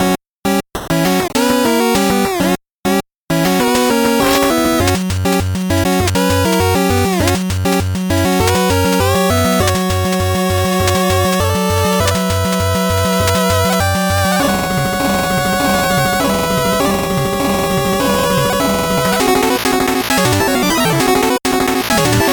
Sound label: 8bit bgm fast